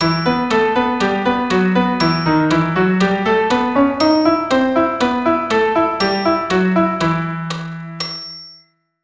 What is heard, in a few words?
Em melodic